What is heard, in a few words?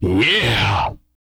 yeah, vocal, hip-hop; TB HIP-HOP